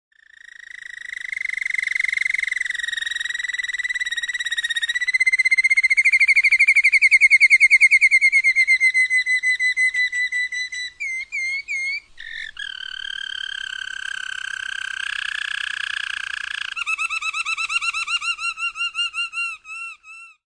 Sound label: roller canary